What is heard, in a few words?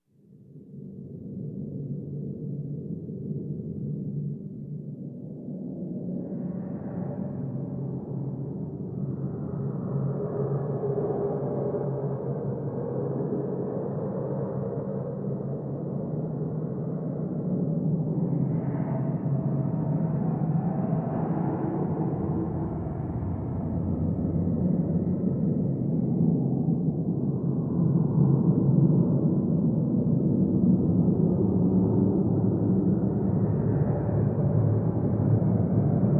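Spirit Wind Desolate, ghosts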